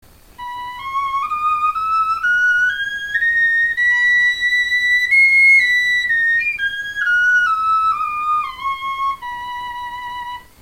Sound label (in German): tonleiter, knochenfloeten